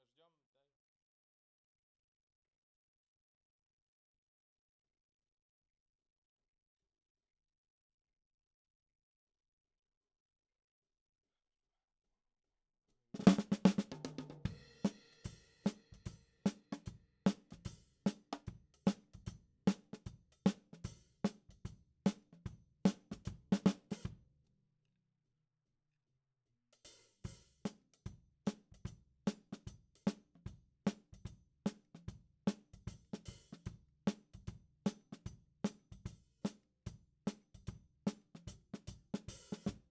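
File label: Snare top, Ragtime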